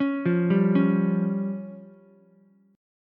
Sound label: C sharp Major Flat 5th, Ukulele, C#Mb5 chord; C#Mb5 arpeggiated; JGuitar